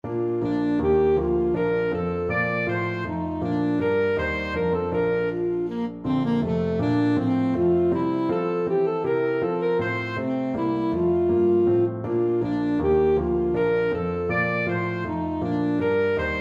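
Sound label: bouree, alto saxophone; handel water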